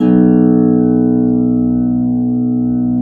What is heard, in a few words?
B1 HARP; USB Soundscan vol.62; AKAI